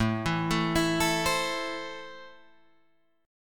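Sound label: A Minor, Guitar Drop D, chord strum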